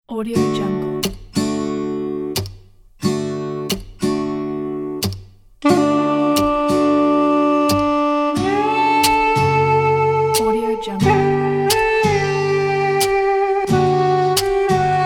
starry winter sky; airumian

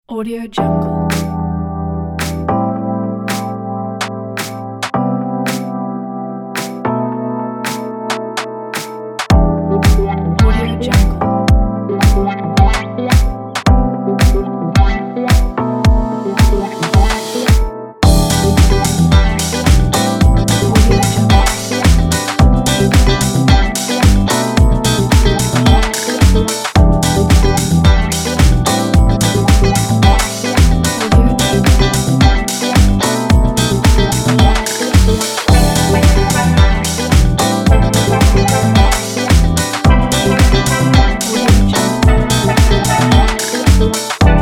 summer funky

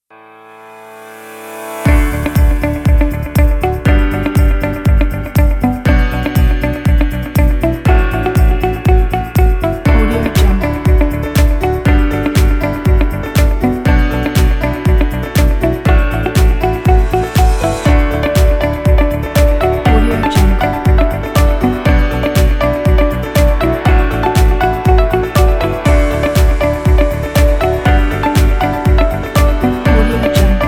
uplifting inspiring upbeat corporate

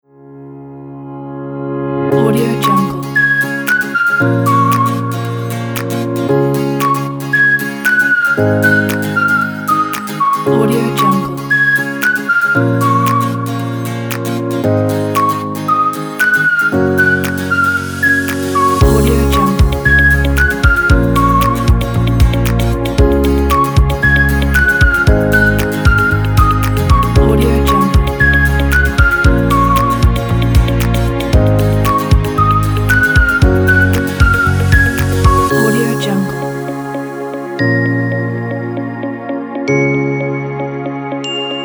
guitar happy whistle

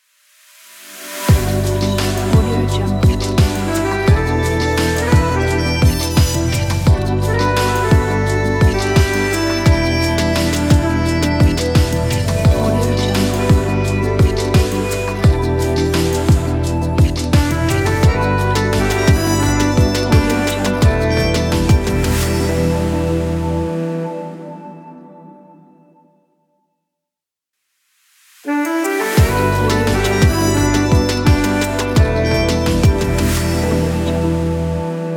chill smooth jazz intro; sonorius

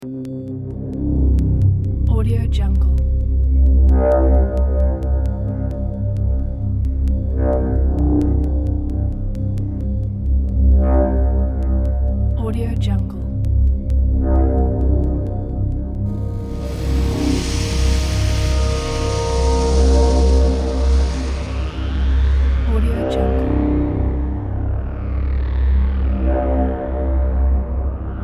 tension atmosphere

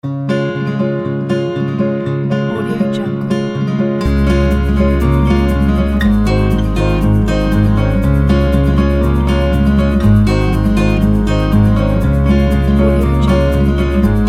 happy and mellow

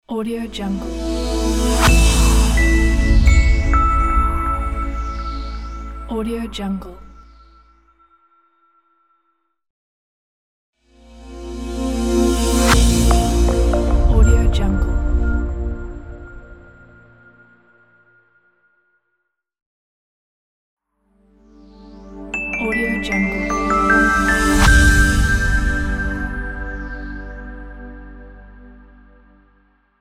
elegant logo pack

